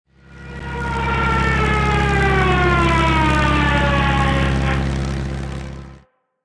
Falling Plane

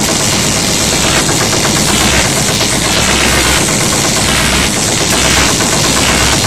Electro; guns n zombies 2014 pc

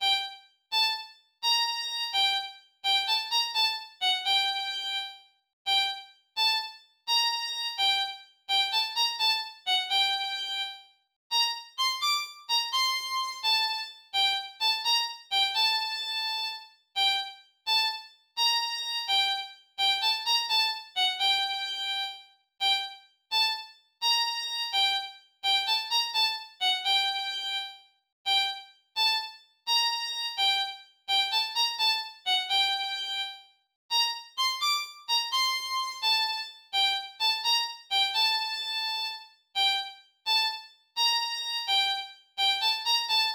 Fiddle Game Tune

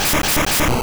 Mega Kick; sfx; attack moves; pokemon; gen 2; gsc

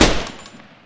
AUGA3

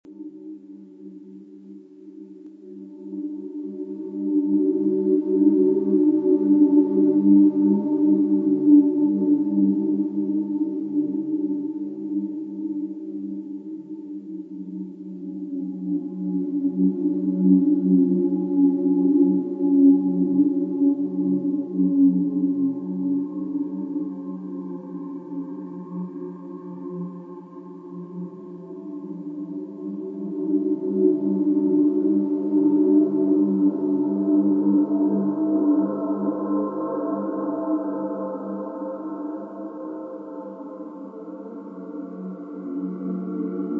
Ambience; Mace Griffin Bounty Hunter; ps2 windows xbox

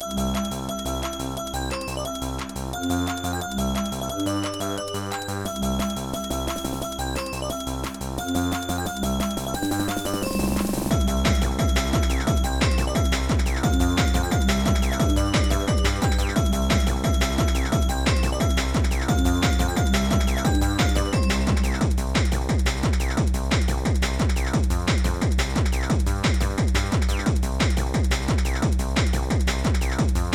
Jingle Bells; mars rising

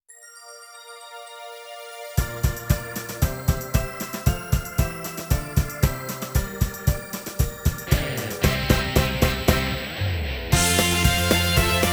(Karaoke); megachu megamisama chuuihou windows gamerip 2006

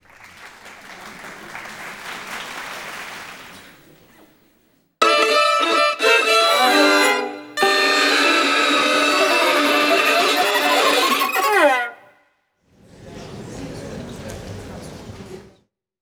Thing Sticker - Violin; Paper Mario Sticker Star

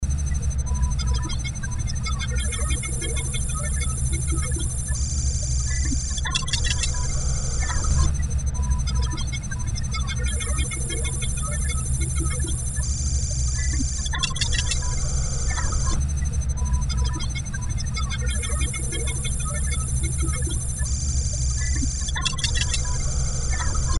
Menu intro loop

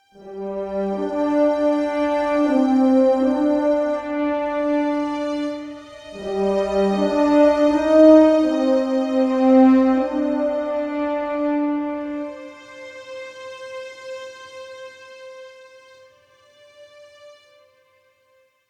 Stinger Swell Happy; Global Conversation

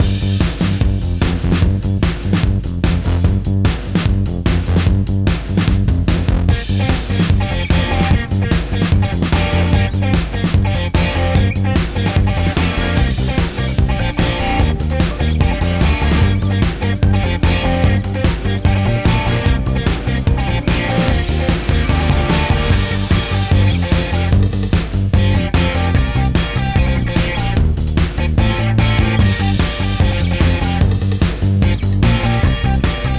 race; GT Racing Motor Academy; mobile

